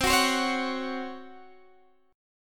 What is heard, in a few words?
Db Major 7th Suspended 4th C